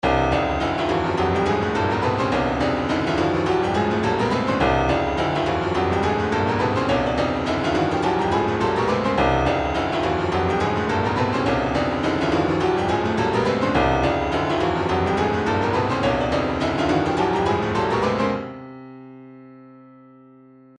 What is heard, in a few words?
retro funk soul drums; Retro Funk Disc B